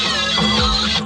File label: a siren loop